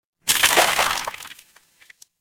water splash

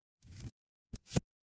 ui undo

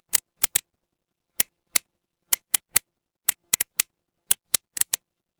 office pen

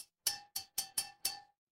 glass glass tap

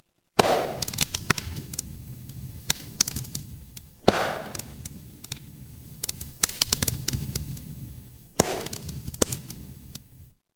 ambience fireplace

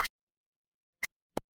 retro game combo

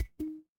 feedback success

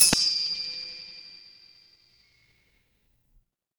instrument bell

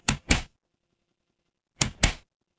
mechanical switch

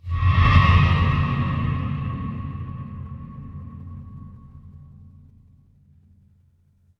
horror stinger

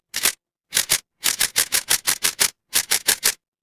office camera

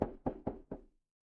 impact wood knock

